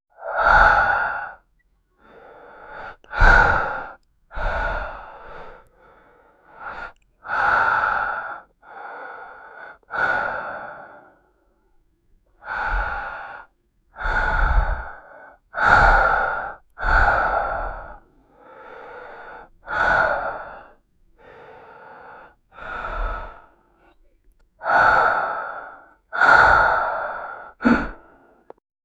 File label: young man breathing under